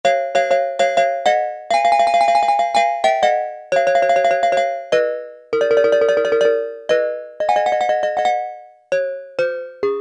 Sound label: STEEL DRUM, Yamaha DX7